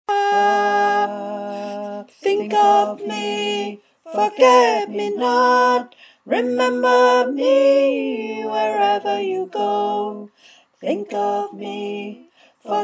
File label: high tenor